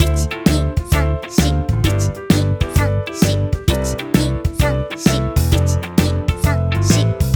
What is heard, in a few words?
4-4 shuffle count